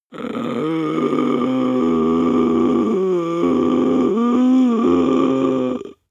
breath male inhale whine

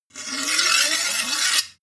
sword blade scrape long

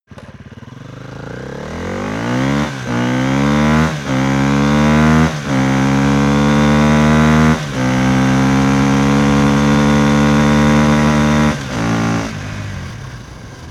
motorcycle sport bike accelerate decelerate

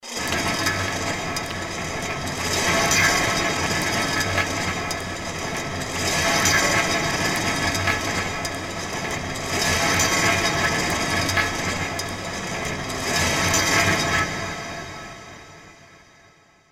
bowl

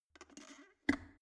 plastic water boiler close lid

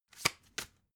playing cards shuffle single

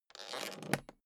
suitcase close latch lock

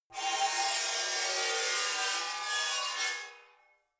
metal scrape rich straight

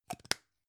gum plastic box close

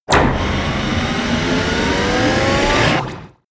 wind up

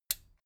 metal button stereo push enable

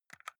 console controller xbox arrow key press fast

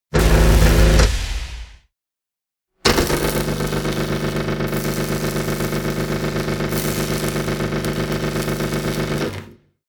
buzz

